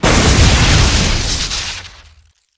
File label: Explo Large Generator; Destruction